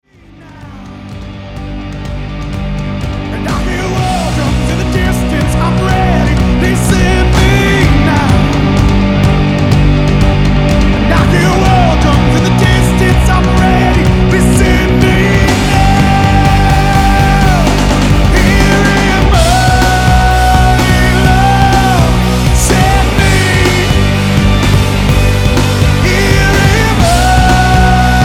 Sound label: War Drums